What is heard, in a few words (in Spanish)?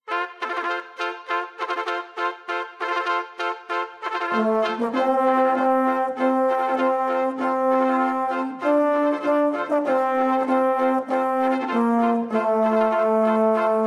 Himno con canto